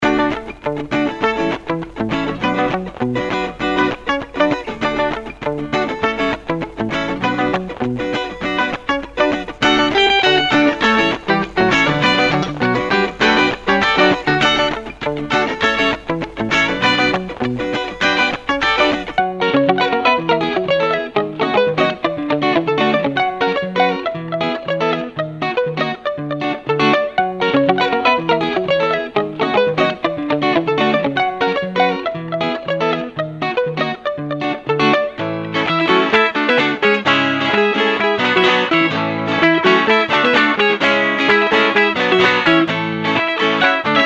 African Guitars

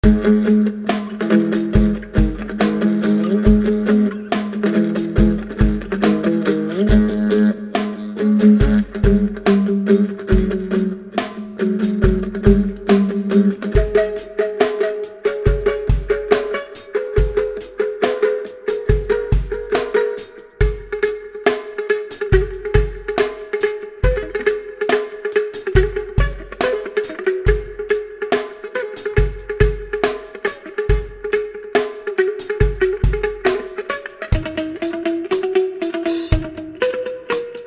Odd String Thing

